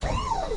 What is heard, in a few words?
move; Apotheorasis